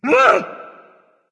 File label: fear; smite; izanami